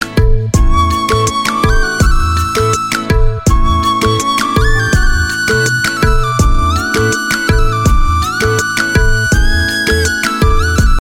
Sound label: lovely romantic bollywood music ringtone